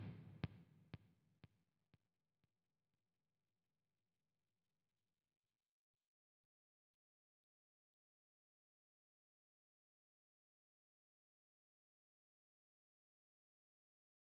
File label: Intro Dive Bomb Left, guitar